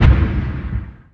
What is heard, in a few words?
Collision; Defiance